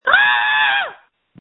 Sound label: Scream